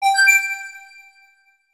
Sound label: Magic Flute; Reptilian Rebellion